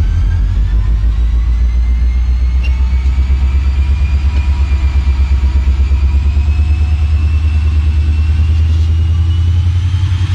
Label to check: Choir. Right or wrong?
Wrong